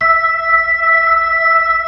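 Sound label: B-3, Brass Chorus; Br. Ch. Xfade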